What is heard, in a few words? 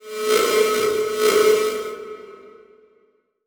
Transition Whoosh Multiple; Production Element; Title Transition; AdobeCC